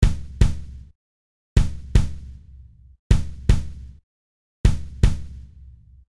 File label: kick 47Hz Parallel Blend